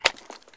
mp5 fidget, weapons

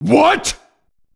evil pain; buu

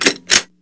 pistol ready

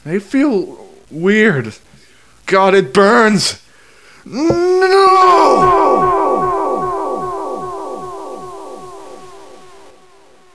die; barney

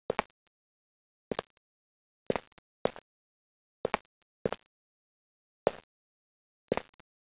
slower walk

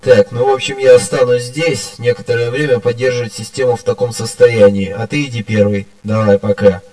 teleport; helmet

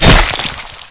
hit body

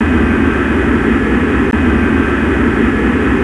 jet, plane

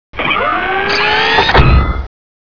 gunner powerup

